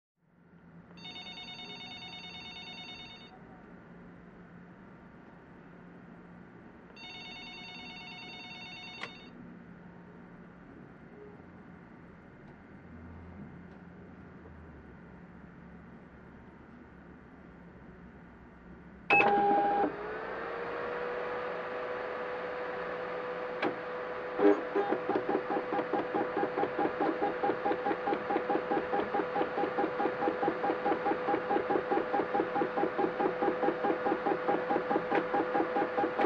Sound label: Fax Machine Receiving Document, Ring, Machine Power Up, Words Being Printed, Paper Cut, Power Down.; Fax Scanner Copier